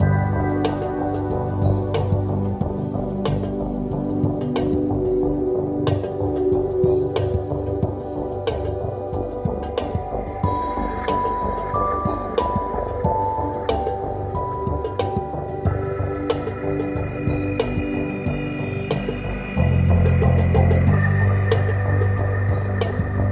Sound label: Death Loop; Voidigo